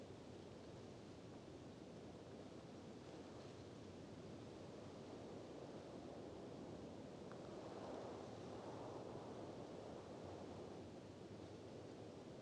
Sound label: ambience beach windy; Rust